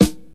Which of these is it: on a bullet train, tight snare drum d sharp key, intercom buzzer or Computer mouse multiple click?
tight snare drum d sharp key